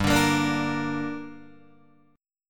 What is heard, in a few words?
G Minor G, Guitar, DADGAD; chordshape; down